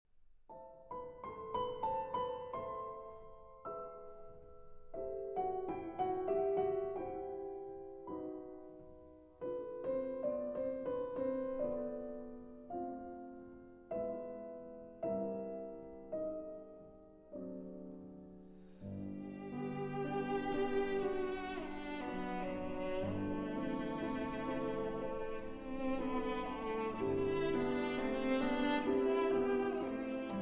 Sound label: Piano Trio in G Major, Intermezzo. Andante espressivo; Debussy